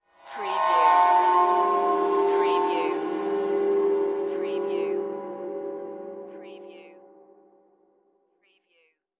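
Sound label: Production Element Cymbal Swell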